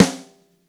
Gretsch Snare; 1967 Gretsch Kit; One-Shots; Sonic Foundry (Sony Creative Software); Vital Drums

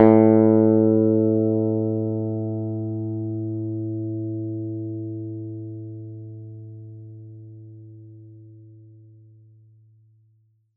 PLK EGUIT A2, Acoustic and Electric, Plucked; Polyend; Simulacrum Sounds; 1 Shots

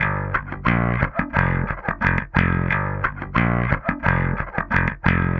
Bass

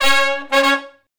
R&B Riff (Db) unison, Horncraft; Crimson Blue and Fabulous; Sony Creative Software; Horncraft 4 RnB

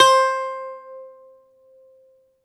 BALALAIKA, Russian Strings

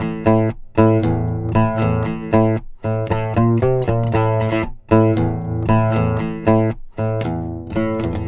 Oud, Arabic; Sonic Foundry (Sony Creative Software); Continental Drift Aftershocks

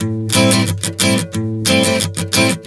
Strum 090 Am, One Chord Strumming 090 bpm

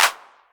Clap, Novation Drumstation; DrumstationV1

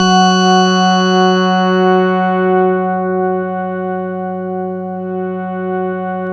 SYN PPG, Microwave; Sound & Vision - Gigapack I CD 2

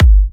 G, kicks; markus hakala kicks

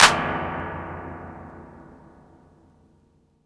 CLAP, 808; Drum Kit; Polyend; Stazma & Concrete Collage; Spring808

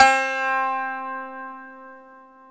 TZOURAS C 3, Mediteranean Traditions; Soundscan vol.53; AKAI

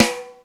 DRY S, drum; Crypton; DrumBase5000

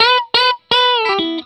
RIFF 120, Vintage Blues Guitar

